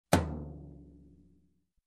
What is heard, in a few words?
Low Tom Hit